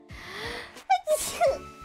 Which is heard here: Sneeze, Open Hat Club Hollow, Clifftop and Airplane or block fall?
Sneeze